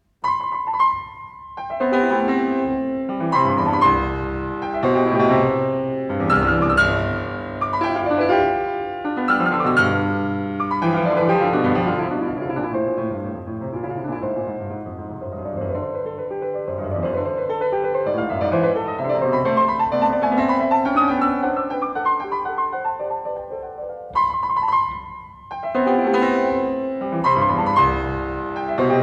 Variations in C major, Allegro ma non troppo, Piano; Beethoven; Diabelli Variations; op.120; Brilliant Complete Beethoven Edition; The Piano Collection